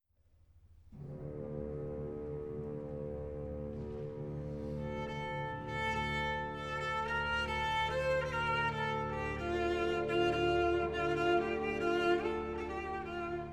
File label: cello concerto